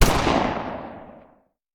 Hand Gun; Sound Ideas; Guns

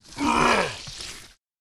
zombie attack

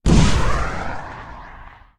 bazooka launch; Blind Drive

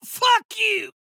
hit reaction; abe; Blind Drive

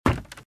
Footsteps - Wood - Creaky - Run - Left

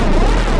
Scifi Synth Drone Loop; SFX Kit; Sci-Fi Loops

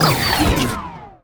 Pickup Scifi; SFX Kit

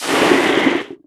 Poison; Kilta

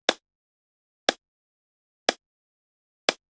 Generic Button Plastic Small

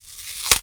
Wrapper Tear; Office Supplies; 1001 Sound Effects

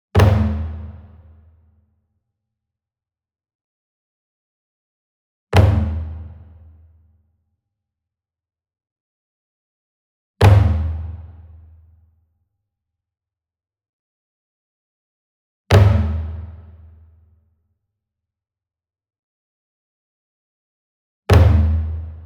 SINGLE DRUM Kodo Flam Hard Beater Medium; boom library; Cinematic Strikes Construction Kit

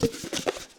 Cardboard Popcorn Box Open; SFX Kit; Foley - Misc

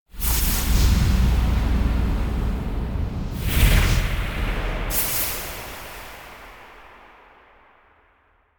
Hit Pouncing Scary Hits Three Various Hits; Hollywood Edge; sound designer's toolkit v2

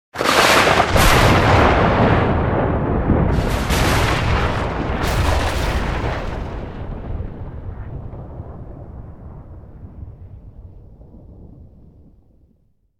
Thunder Clap - Heavy, Triple, Longer Tail; Hollywood Edge; sound designer's toolkit v2

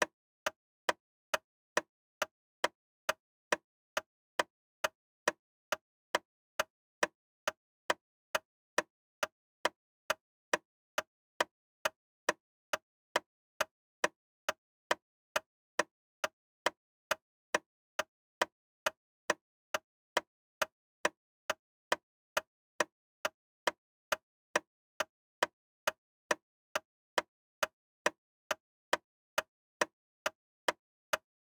Wall Clock Ticking; Clocks, Watches and Timers; Blastwave

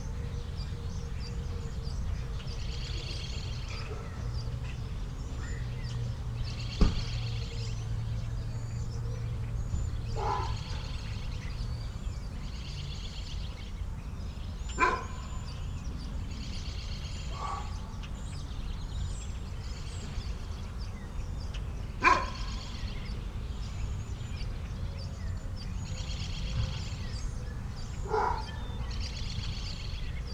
Suburban morning dogs birds; urban and suburban; boom library

